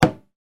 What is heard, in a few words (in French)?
dundunba m ferme